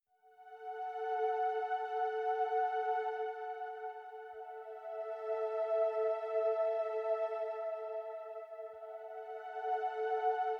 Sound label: pads only